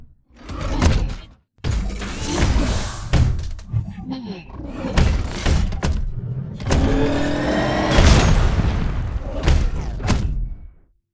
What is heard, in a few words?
Zipline Fight Far; Beacon; BT; Titanfall 2